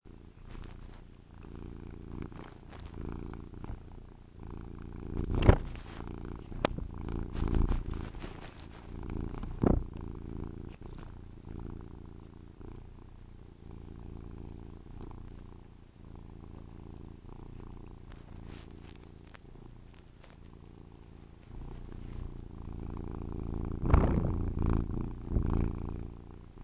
purr

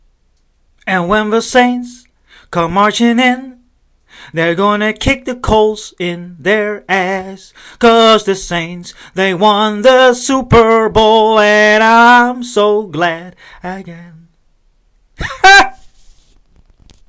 saints victory

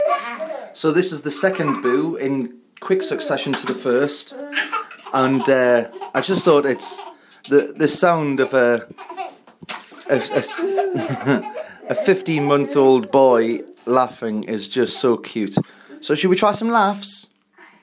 baby laughs

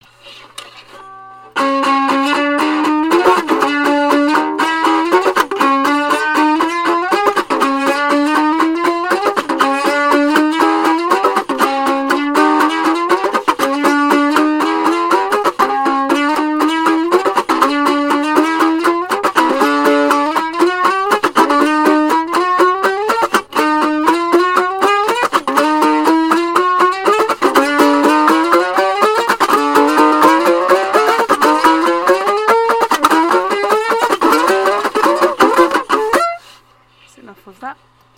electro acoustic tenor ukulele

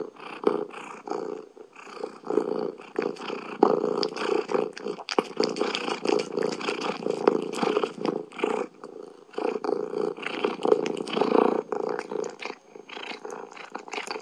cat purring

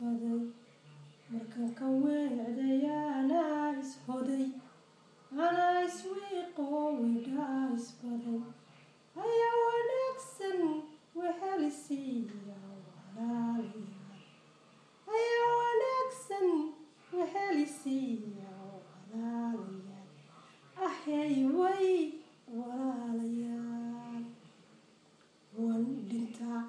mama singing somali again

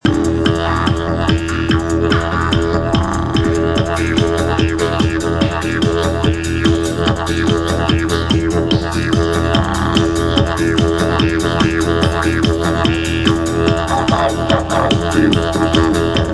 didgeri

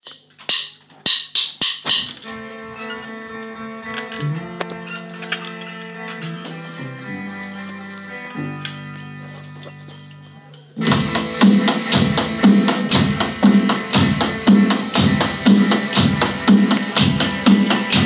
4 4 drums live

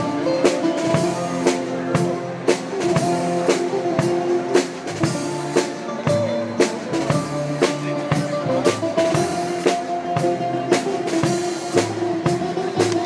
music at norfolk greek festival

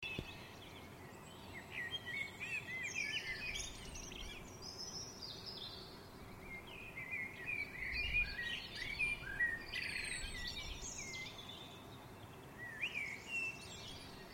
spring dawn chorus, Dun Laoghaire

